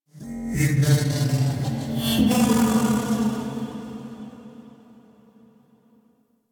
Tech Texture Med, Ambience; Warframe; Cephalon Weave; DE

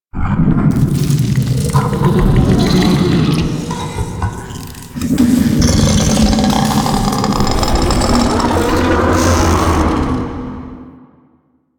Death Vocal Rise; Archon Death; Archon Boreal; Warframe; The New War

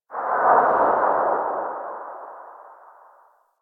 Void Move Default; Powers; Warframe; Focus; Operators; Wraith

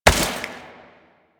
Step Water

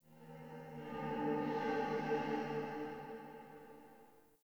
Hub Texture M, Ambience, Dungeons, Under City; Silent Rose

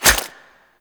Infested Packet Claws, melee attack; Warframe; Infested Lich

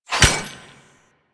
Glass Shatter Damage Hit; DE; Warframe

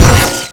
Disc Illuminate; Warframe; Weapons; Morph Weapons; Razor Disc

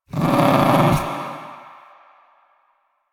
Ambient Meow; Warframe; Kalymos

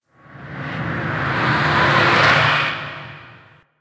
Crp Ship Gun Battery Beam Void Burst Close C; Ambience; Props; Warframe; Corpus Ship Remaster

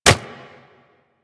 Nunchaku Catch; Warframe; Tenno

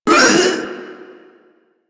Proto Infested vocal short attack; Warframe; InfestedNinetyNine

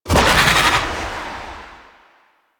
Fairy Soul Cast; DE; Warframe

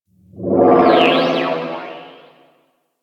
dark texture; Warframe; Corpus Railjack; Ambience

